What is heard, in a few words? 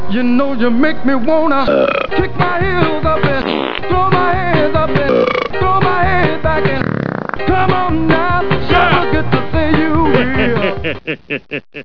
shout